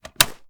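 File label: sniper reload fast extended mag mag out; gmod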